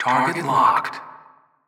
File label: Target locked hall